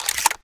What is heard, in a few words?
shotgun insert